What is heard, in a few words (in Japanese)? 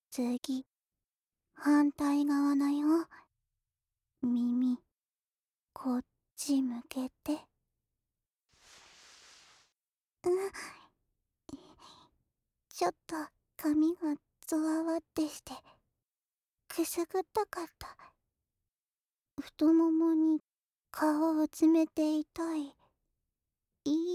耳かき・右, ASMR; こっちの耳も綺麗にしていくね…